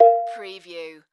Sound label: Multimedia Error